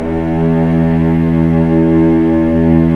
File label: STR VC3, Vcs3 mf vb; String Master Series